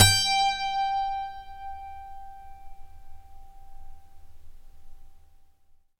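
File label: GTR G2 12; Synclavier Sampler Library; KEYS AND GUITARS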